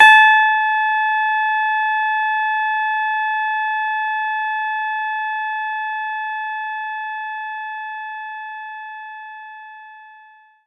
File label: Clavinet A5